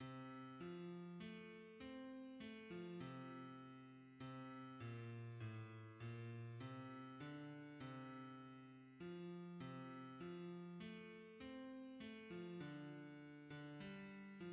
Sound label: bass; hymns; Thou God of grace our Father